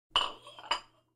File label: Cup Placed On Saucer; Glass cup and Saucer; صدای استکان، فنجان و نعلبکی